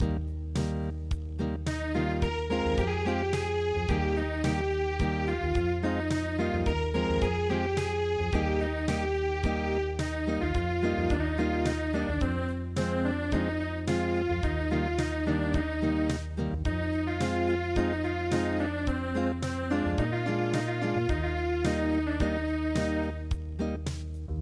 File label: Acoustic, traditional